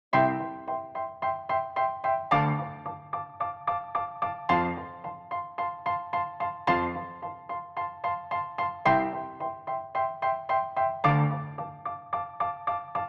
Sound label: piano; Logic Pro 11; Session Keyboarder